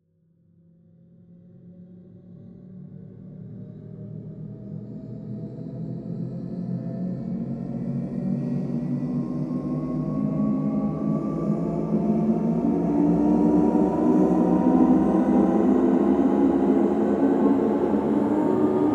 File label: Anxious Chant Shimmer Shepard; NI; Mysteria